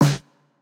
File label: Jrock snare